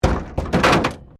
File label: Wreck Door Panel Clunk Metal